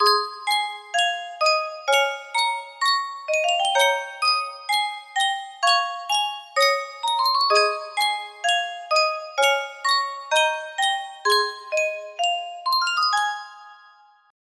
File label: Sankyo music box, music box melody